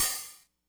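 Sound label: hihat, Breakdance 172 B minor; Big Fish Audio; Hardcore Breakbeat Action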